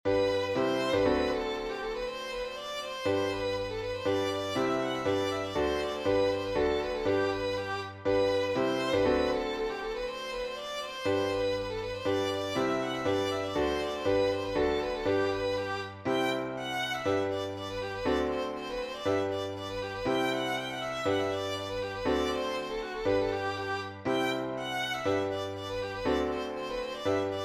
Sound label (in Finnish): polkka